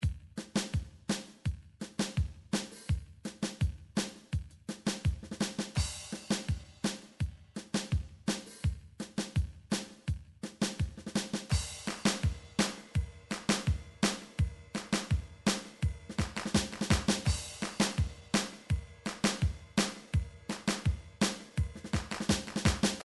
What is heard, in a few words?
drum midi surf